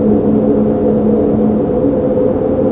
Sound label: industrial, ambience; source engine; hl1; video games